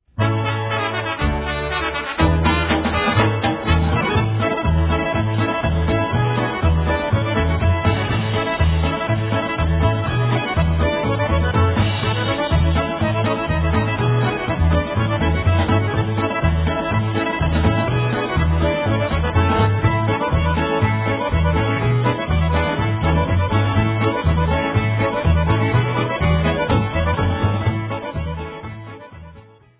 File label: Brass Pizzicato Polka